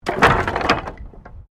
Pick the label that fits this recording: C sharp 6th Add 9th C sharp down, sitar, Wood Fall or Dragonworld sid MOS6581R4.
Wood Fall